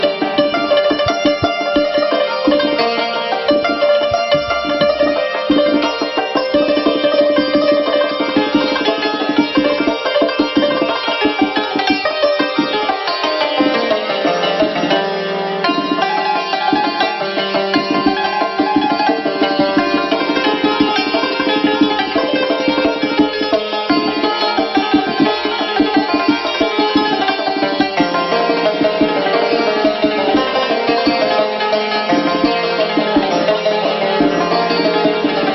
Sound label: Santur Tonbak